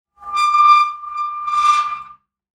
Squeaks and Moans, Just Metal; SoundBits

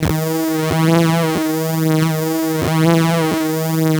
Bass drone E, Circuitbend; Drones - Bass; 99Sounds; Mangling Audio

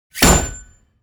IMPACT METAL Scratchy Short Punch; Impacts, Hits & Whooshes; SmartSoundFX

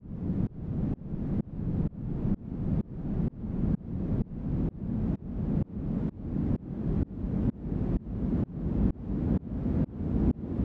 Long Sweep, White Noise

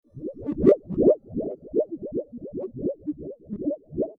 Under Water Sound; FXHome

